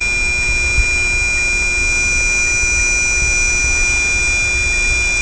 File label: HUM, Pads; Distored Reality 1&2